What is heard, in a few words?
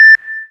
noise 2, sound noise; NOISE+ALARM